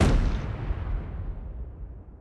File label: MOVE; C64 Dreams; Multi-Sound Default